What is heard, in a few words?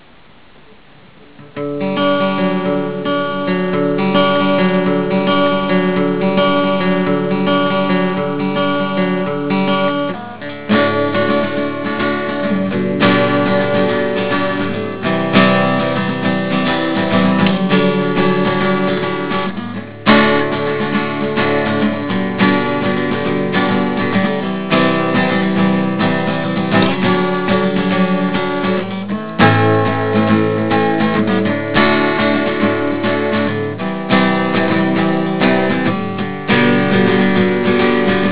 guitar; clips of songs that I have been working on